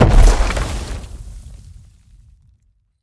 Bank Hole Land; The Town

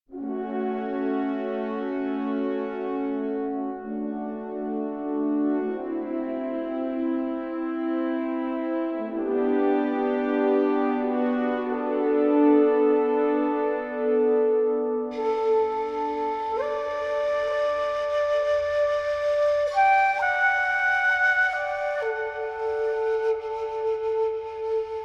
Exploration; Skellige

